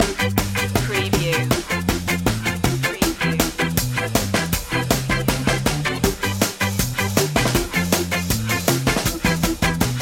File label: happy ska